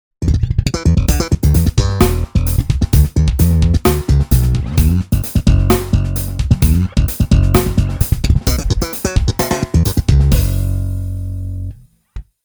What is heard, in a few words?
Slap Drums